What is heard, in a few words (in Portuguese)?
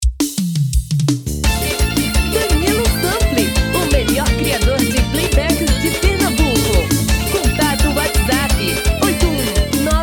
caboclinho